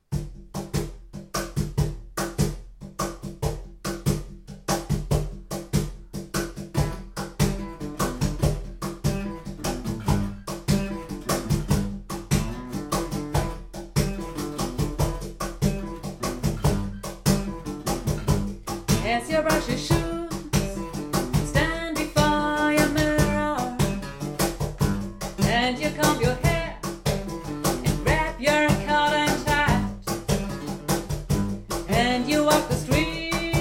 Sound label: cajon